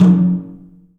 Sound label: DRUM; The Piano; InVision Interactive